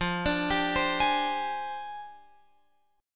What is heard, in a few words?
F Minor 6th Add 9th F down Guitar Open F; JGuitar